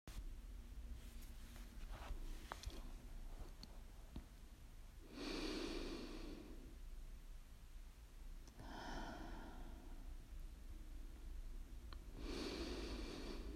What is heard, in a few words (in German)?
Atemgeräusch; menschliche laute